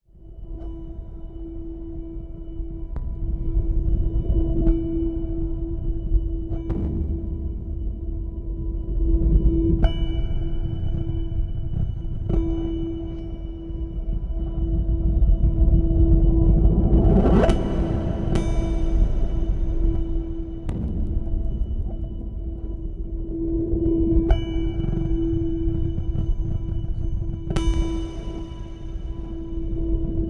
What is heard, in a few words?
raindrops on glass; ambdsgn; geophonic design vol 2; fvtt sonniss gdc audio